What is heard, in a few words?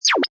Button sound or menu sound, 'affirmed'; Multimedia